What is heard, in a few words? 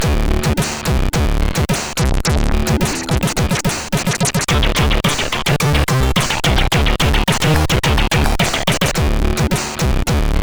Test, CSG8580; hvsc